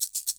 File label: Shaker; Zero-G; Nu Disco